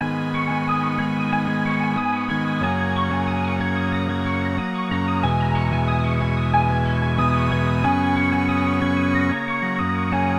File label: synth echo amplified